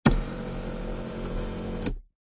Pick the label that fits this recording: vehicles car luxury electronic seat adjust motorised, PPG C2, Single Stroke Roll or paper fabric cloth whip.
vehicles car luxury electronic seat adjust motorised